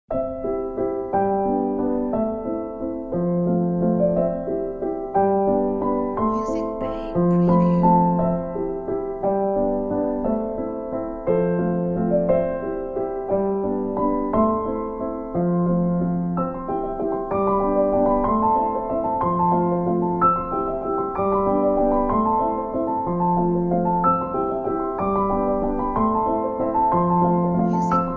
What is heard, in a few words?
Inspiring Piano Waltz